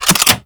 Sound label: Archwing Sword Unequip; Warframe